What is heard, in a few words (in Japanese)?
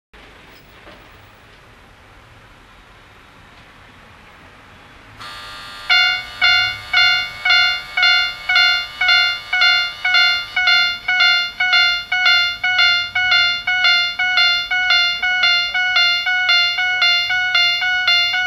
FUMIKIRI; 手動時代